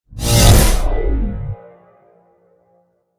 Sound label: smash